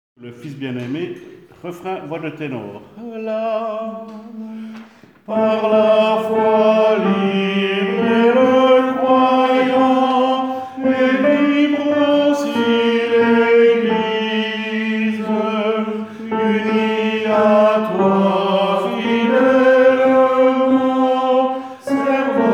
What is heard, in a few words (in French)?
Il faut que le fils ténor